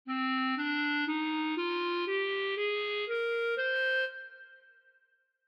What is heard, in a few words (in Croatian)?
d mol, ljestvice, clarinet